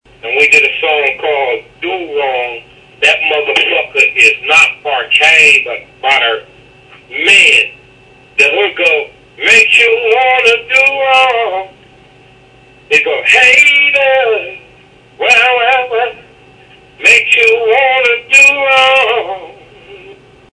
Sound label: singing